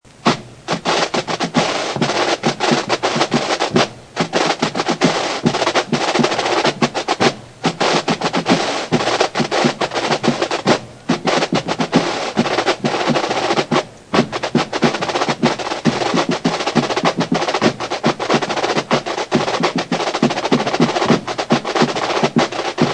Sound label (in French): batterie